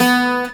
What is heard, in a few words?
C.GTR SOFT B2; USB Soundscan vol.42; AKAI